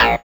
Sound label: SYN DANCE; Sound & Vision - Gigapack II